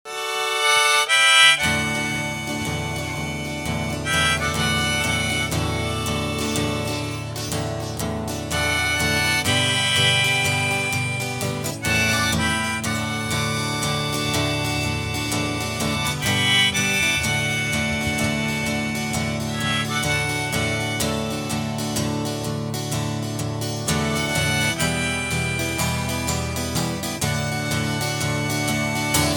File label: songs